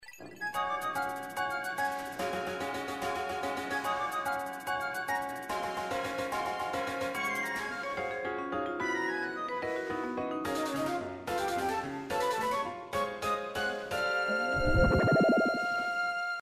day complette; pikmin 3

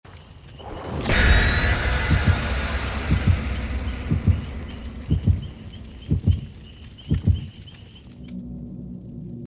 lobby start; Dead by Daylight

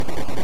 rotor; hero

